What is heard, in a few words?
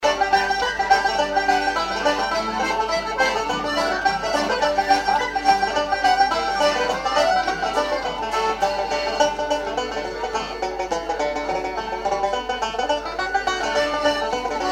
Celtic Session